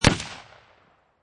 Rifle Marlin 30-30 Single Shot Exterior At 45'; Rifle: Marlin 30-30, Single Shot, Exterior at 45 feet

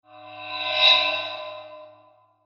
Mysterious Approching Metallic Transition; Scary Soundscapes; CraftedSignals

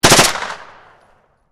MP5 short burst, Submachine Gun MP5 Short Burst; Machine Guns; VRSonic

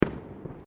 Fireworks Single Firecracker

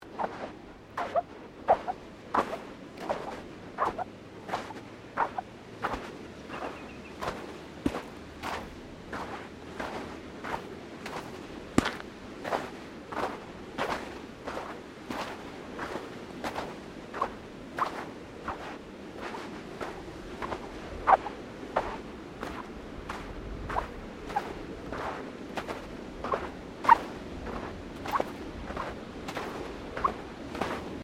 Footsteps Boots Sand Squeaky Beach Sequence; Footstep Foley; Human

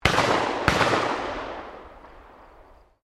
Shotgun 12 Gauge Double Barrel Firing 2 Shots; Sporting Shotguns

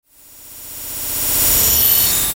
Digital Reverse Swell Delay; glitches; Blastwave

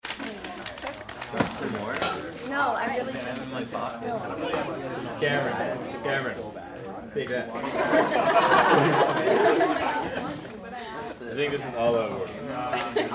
Crowd High school Class Banter; Awwh; Schools; BigRoomSound

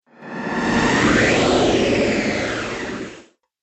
Scifi Afterburner, spacecraft; Spacecraft Sound Effects

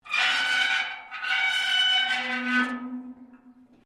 METAL RUSTY SCRAPE LONG

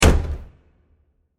Smashing Wood Door; Miscellaneous Impact; Impacts; Crafted Signals

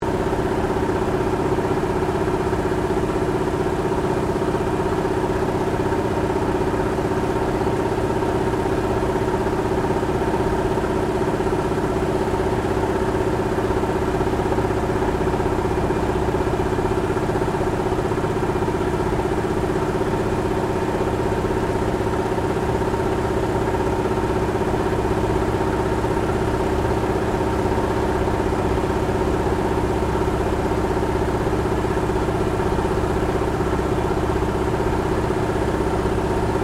AIRCRAFT CAC WIRRAWAY FLYING INTERIOR STEREO; CA-16 Wirraway; Airplanes

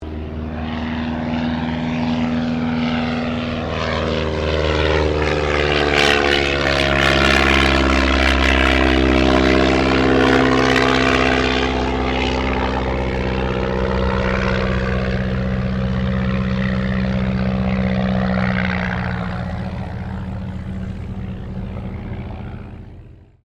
AIRCRAFT DH82A DEHAVILAND TIGERMOTH PASS

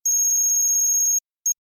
Beeps Data Transmission Technology; Scifi Science Fiction Multimedia

